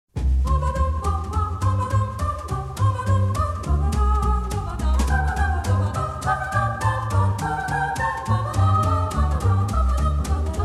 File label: Fugue In C Minor